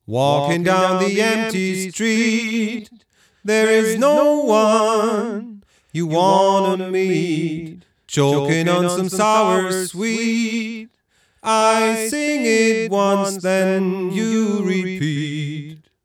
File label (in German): Echo Fix EF X3 Vocal RE 501 Bandecho